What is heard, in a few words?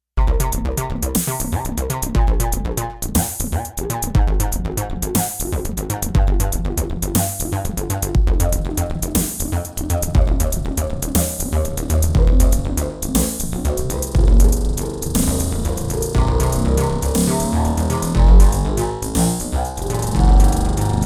Eventide H910 beat dry wet manual feedback